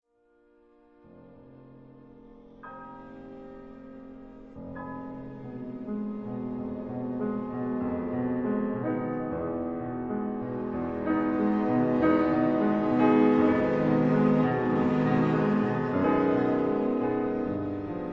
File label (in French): Quatuor piano; Paris : Heugel, 1946; 4. Allegro assai